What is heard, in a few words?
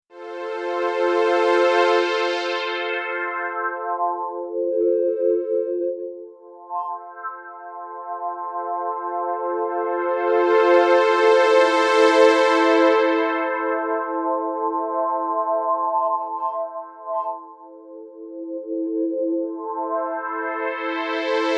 Strings